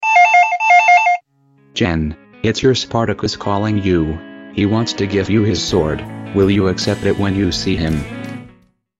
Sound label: Sword; Spartacus